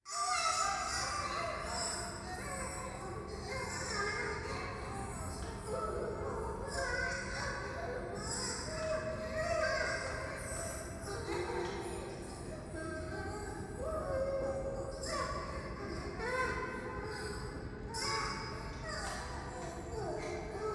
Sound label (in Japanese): 風呂 シャブ喘ぎ 電車, ASMR